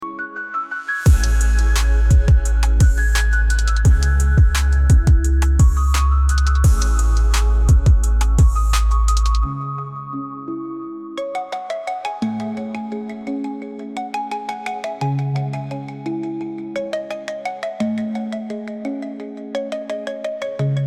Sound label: short techno piano fusion mix looped